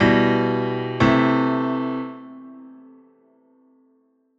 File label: key check minor